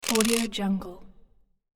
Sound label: foley coin flip single fast; domestic sounds